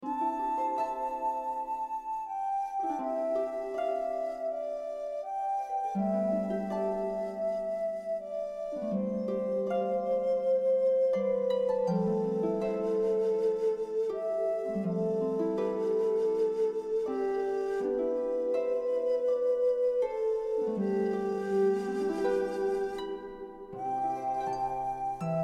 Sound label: Lullaby